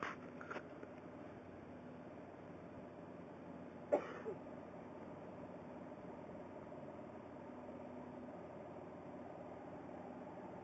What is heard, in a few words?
180mph Toyota Supra